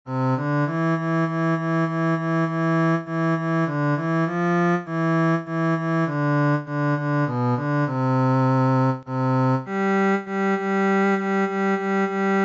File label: Indian National Anthem Cello